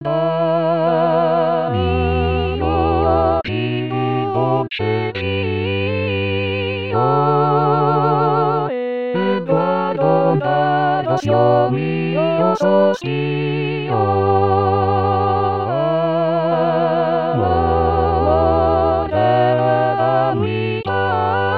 tutti

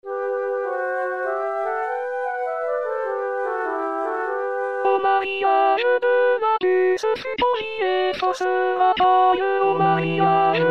alto

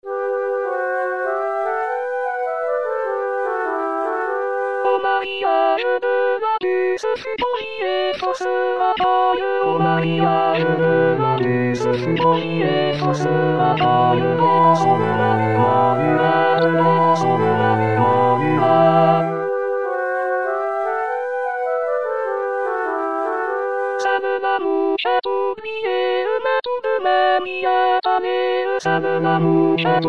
tutti

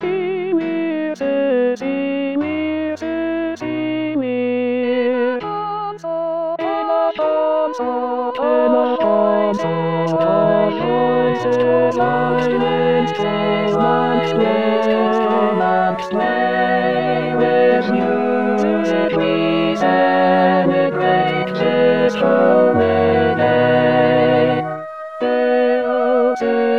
lent tutti; 2022 baroque

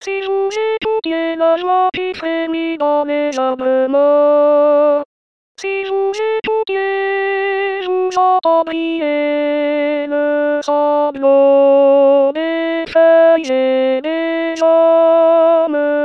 alto; rimailleurs

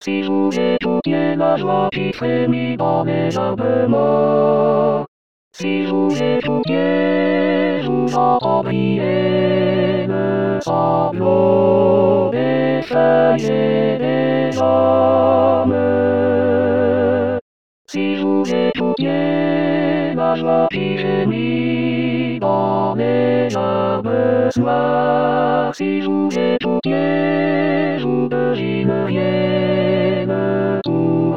tutti; rimailleurs

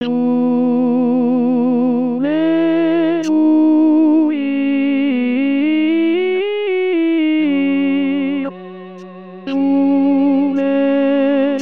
tenor

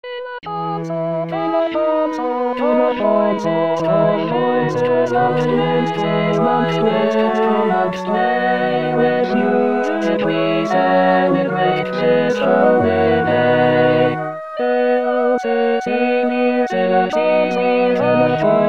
tutti; baroque

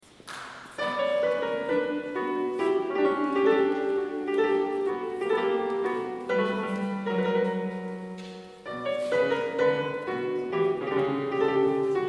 gavotte

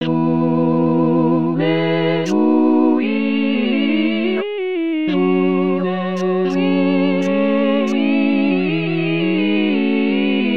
tutti

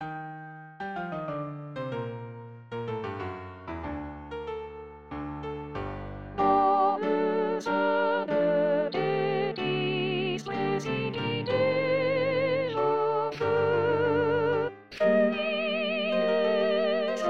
soprano; sein thetis

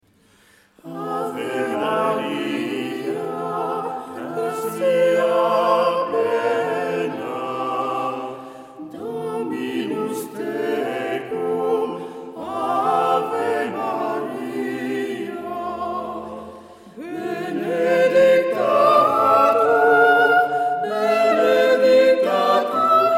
ave maria arcadelt

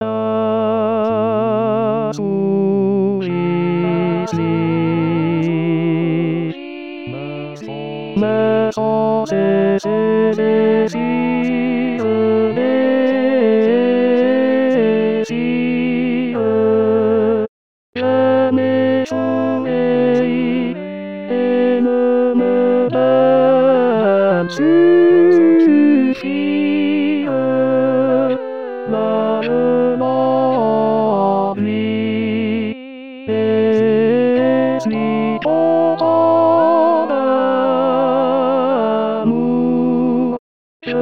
tenor; rimailleurs

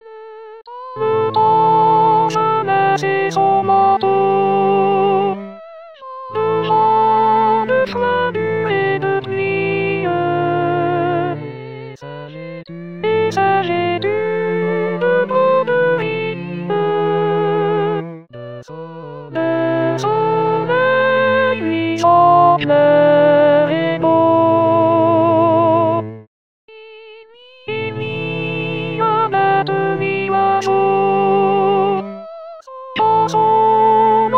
alto

